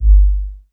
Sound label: Menu Low Tone; Menu FX